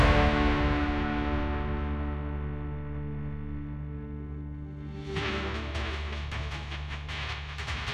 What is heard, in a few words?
epic sting